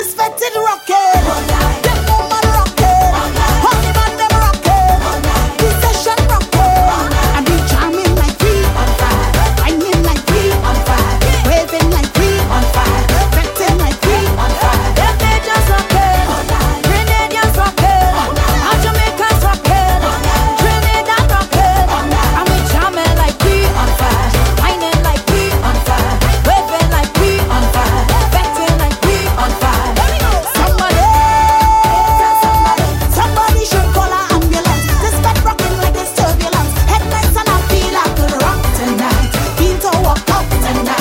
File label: soca